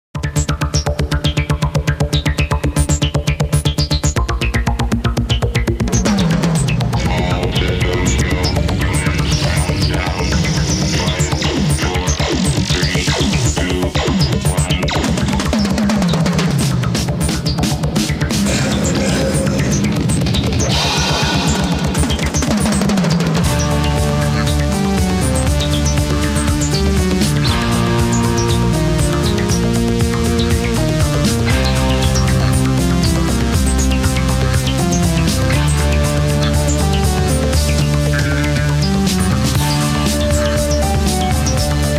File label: countdown tune, jingles